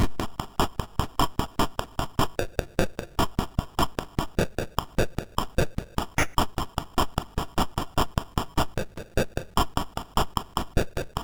sid MOS6581R2

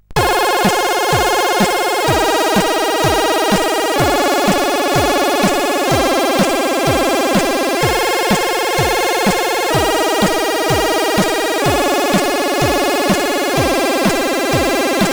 Future Composer, sid, 8580

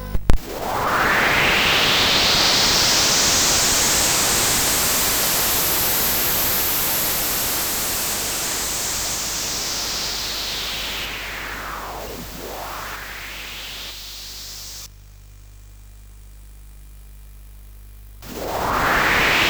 intro, sid CSG8580R5

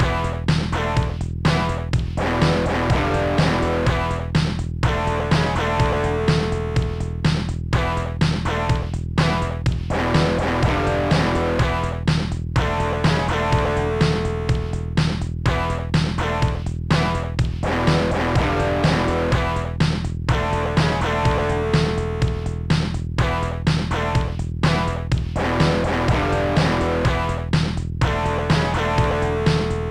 riff; Sonix Music Driver

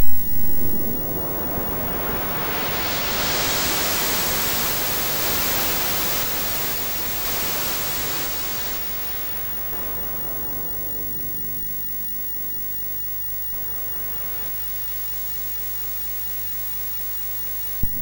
intro, sid MOS6581R2

